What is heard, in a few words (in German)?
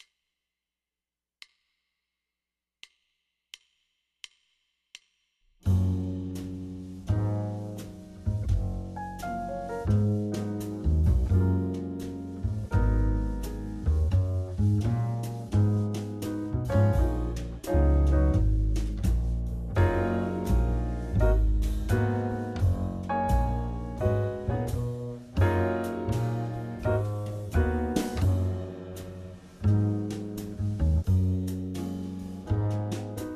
fis dur, saxophone